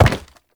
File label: Step Wood; Movement; Uriel; Warframe